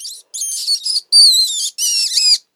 rat squeak

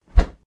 Basketball Shoot; doodle jump 2; android

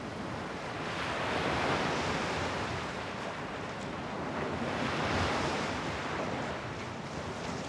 Ambient Sound; pangya fantasy golf pc

